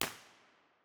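tunnel entrance c 4 way, impulse response, Innocent Railway tunnel; b-format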